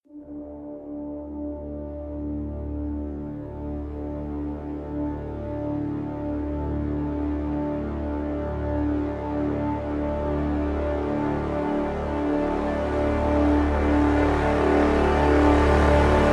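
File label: crescendo brass swell D; brand x music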